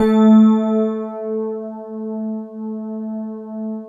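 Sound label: GLASS Rhodes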